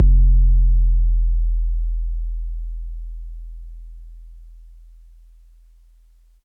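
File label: bass drum 808 MPC60 Noise E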